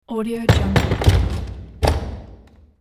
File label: heavy double door with multiple closes; hollywoodedge